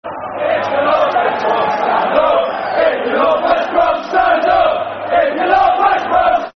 Stand Up If You Love West Brom, Football Chants; West Bromwich Albion Wba; fanchants

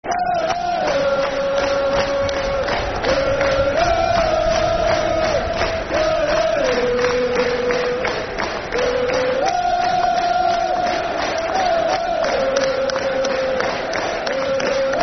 Der Der Der [Football Chant (Soccer Song)]; Blackpool Bfc

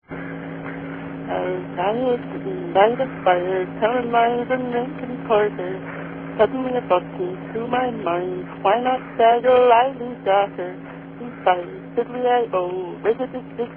One-Eyed Riley

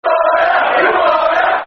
Who Are Ya, Football Chants (Soccer Song); Chelsea Cfc

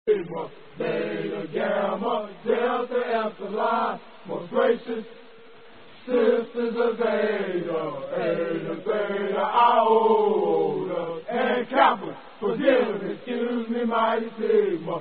Sigma Alphabet Greek Alphabet Song

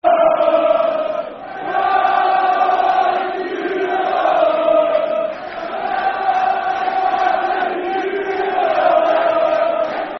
Come On You (Slow) Football Chant (Soccer Song); Come On You Dons (Slow); Milton Keynes Dons Mkdfc

